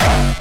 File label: Bassdrums Hard Kicks; Vengeance Samples